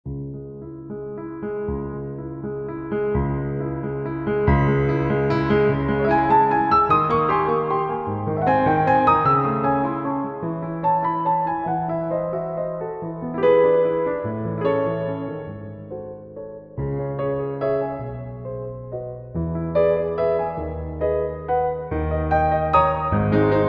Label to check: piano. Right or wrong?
Right